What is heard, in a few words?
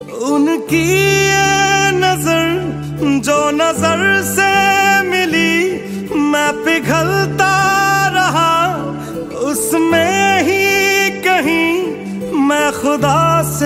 ringtone bollywood ringtones